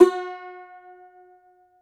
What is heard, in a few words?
cumbus saz F#, plucked strings; E-MU Producer Series Vol. 7 – Old World Instruments